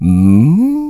"Hmm"; Bearded Baron: "Hmm?"; zelda

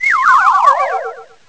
Enemy Parachute; An enemy parachuting (loopable); zelda

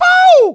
Pow; Tott; Zelda

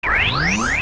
Mirror Shield Absorb Start

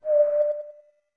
Notes Whistle D med; OOT

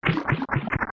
Sewer Fill Begin; LTTP; Sewer being filled with water (2 sounds heard together)

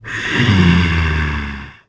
Snore; Salvatore; zelda sounds

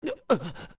Breathe; Mikau breathing heavily

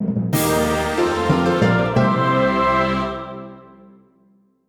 Fanfare; Receiving a Stone Tablet; zelda sounds; Skyward Sword

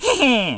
"Heheh"; Coro: "Heheh!" (making a sale)

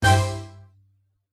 Battle Strike

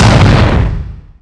Pound; Beast Ganon pounding the ground; Ganondorf

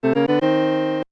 Fanfare; New item fanfare

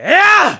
Smash Side; Link

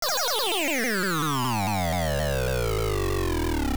Wind Fish Appear; The Wind Fish appearing

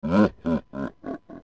Stalfos Laugh; Zelda; OOT

